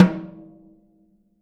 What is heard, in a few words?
SN OFF, snares